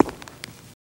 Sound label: FS Male On Hardwood, singles wood